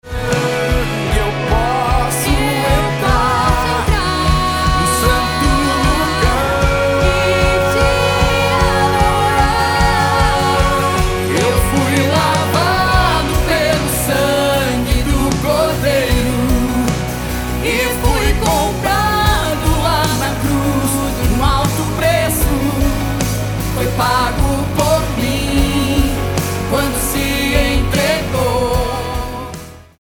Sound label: Gospel